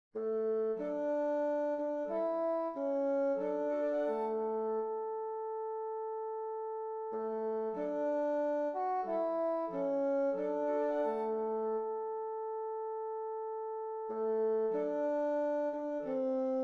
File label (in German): tutti